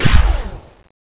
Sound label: wind boost up